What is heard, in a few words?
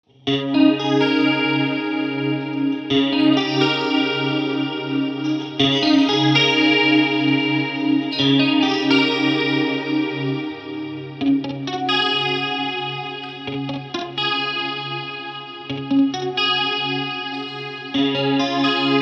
whirly swirly